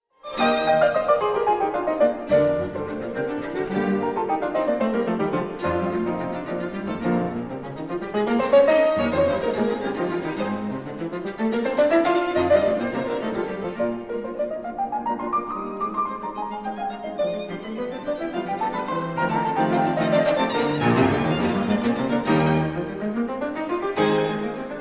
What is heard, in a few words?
quintet for piano and strings in e flat major; robert schumann; op 44; 3rd movement